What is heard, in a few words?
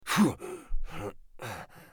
bear wound; eft